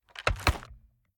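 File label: sniper first raise end; gmod; iw8; xmike109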